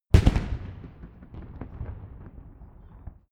rifle salute fire

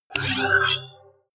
sci-fi click positive medium; maschinen; signale; soundpool

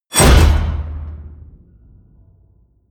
wham, whooshes

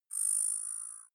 zoom in long; signale

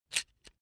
electric drill template slide out slow; soundpool

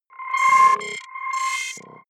button, signale; soundpool

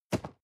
cardboard box medium place hard; objekte

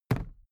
briefcase plastic put down; industrie wirtschaft; bueroarbeit

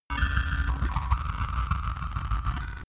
signal interference slow; controller; soundpool

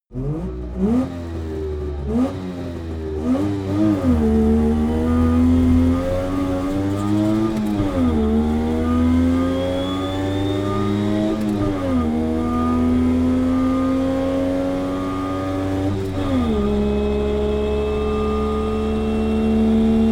car saab 92 rev drive interior

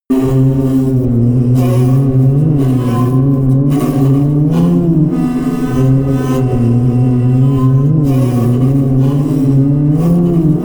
drone erratic didgeridoo high

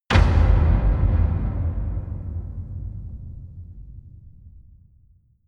dramatic flam; hits-explosionen; impacts

